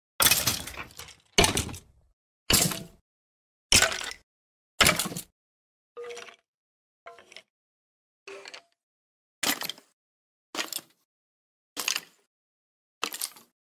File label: Skeleton; Minecraft; اسکلت در ماینکرافت